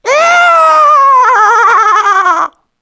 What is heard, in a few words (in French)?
cri; Mobiclic46